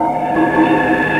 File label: FX, Futurist Drum & Bass